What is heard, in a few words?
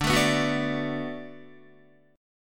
D 6th D down Guitar, chord